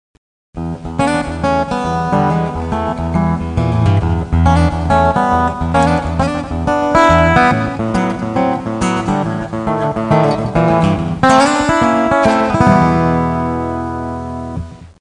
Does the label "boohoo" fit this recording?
No